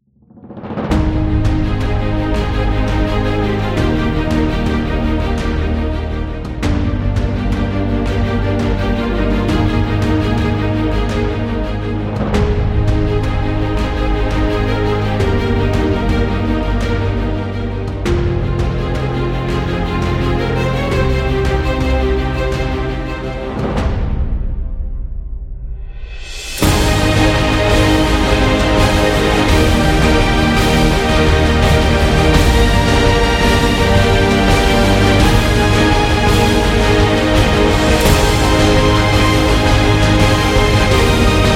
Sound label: Inspirational Epic; self motivation